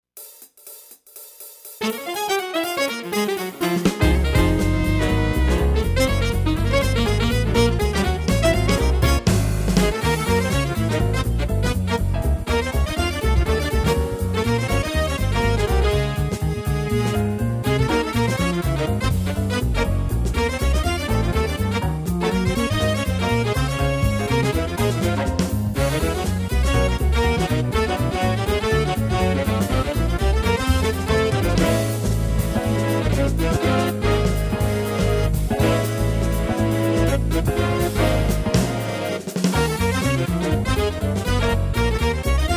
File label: Bebop; Tyros Style; Session